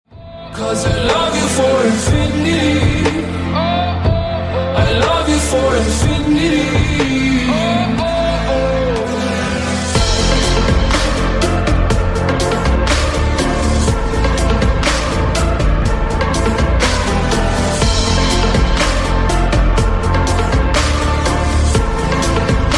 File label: tik tok tune ringtone